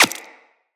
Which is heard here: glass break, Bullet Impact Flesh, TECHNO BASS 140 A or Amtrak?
Bullet Impact Flesh